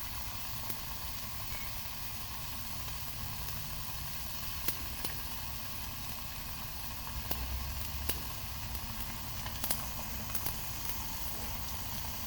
egg cooking; fowl-play; slingshot

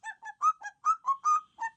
flute; fowl-play; enemies; timmy

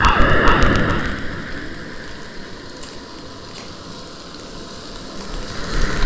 fireball; fowl-play; abilities

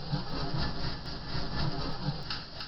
glide; player; movement; fowl-play

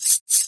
dagger slash; weapons; fowl-play

